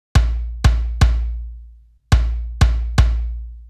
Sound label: Djembe Loop